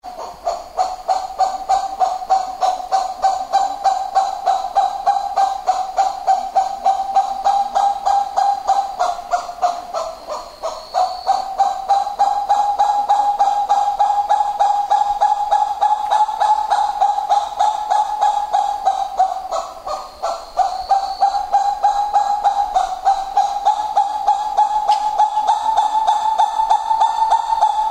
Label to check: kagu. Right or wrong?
Right